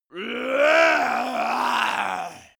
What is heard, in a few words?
monster male growl aggressive; kreaturen